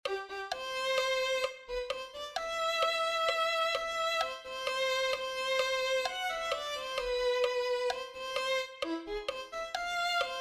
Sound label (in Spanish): reinara el reinara violin